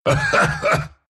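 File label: laugh, Russian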